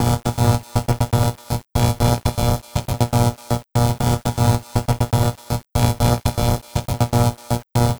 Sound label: Envelope Beat; Drums & Drones